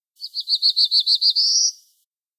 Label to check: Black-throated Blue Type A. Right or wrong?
Right